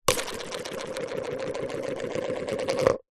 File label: sport golf crazy ball drop into hole spin fast